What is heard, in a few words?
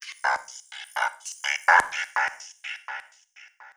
Voc 125bpm; Karmabeat